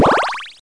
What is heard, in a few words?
Heart Collect Healing Retro